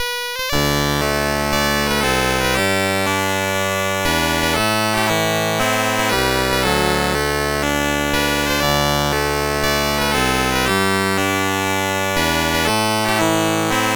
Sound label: sid, CSG8580R5